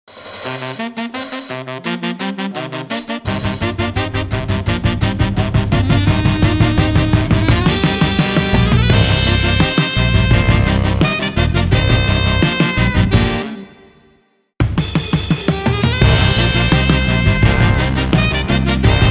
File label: Single Reed Rock